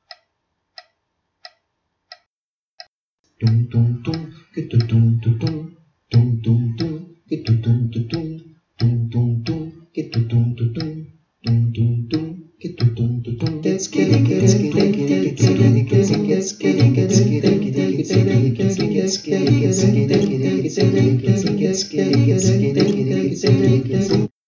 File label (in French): Batucada vocale; surdo